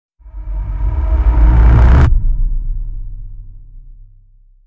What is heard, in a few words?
crescendo